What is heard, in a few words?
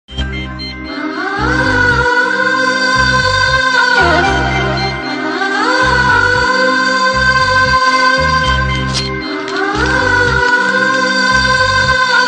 love bgm ringtone